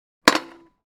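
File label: Skateboarding landing on a steel grind rail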